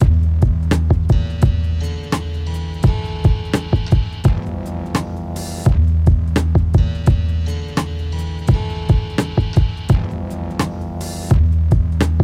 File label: Instrumental, Jazz